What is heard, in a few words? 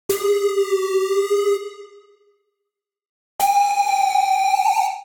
Siren D Minor 145 bpm; MIDI Clips; Ableton; Factory Packs; Skitter and Step